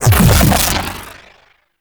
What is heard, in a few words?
Proj Perfect Pulse Impact; Weapons; Warframe; The New War; Omicrus